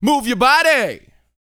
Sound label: VOCAL F; ILIO